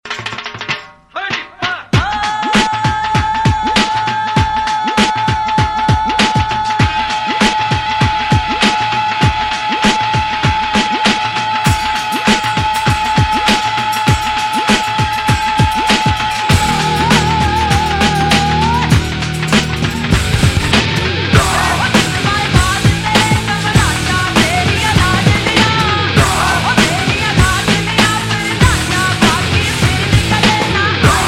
hindi metal

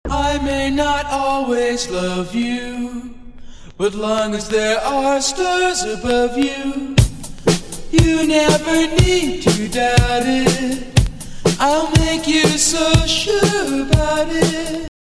(Acapella)

